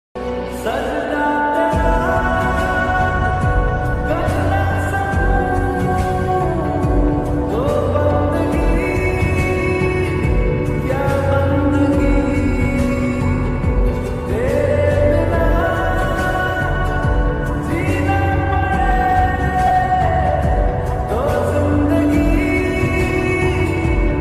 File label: reverb lofi ringtone